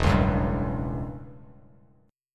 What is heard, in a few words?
E Minor 6th Add 9th E down Bass Standard; Em6add9 strummed; JGuitar